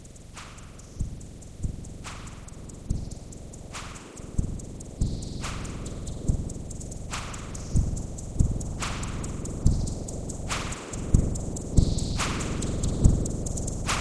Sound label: generated beat 1min interval